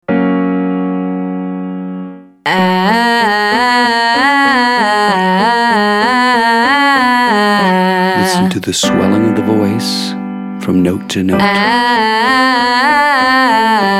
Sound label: Exercise Ah as in Hat ladies; singing lesson